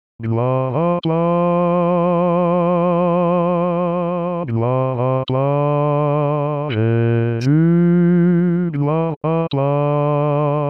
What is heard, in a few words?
Amazing Grace Basse